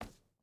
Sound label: footstep burlap wood walk; Rust